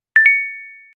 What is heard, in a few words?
card Turn